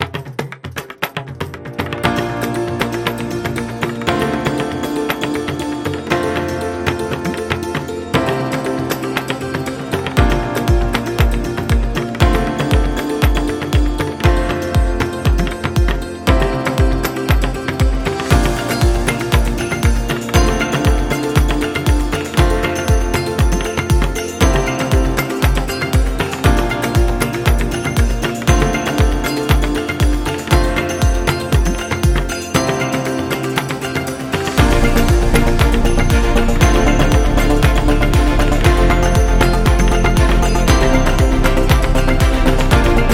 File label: Indian Instrumental Music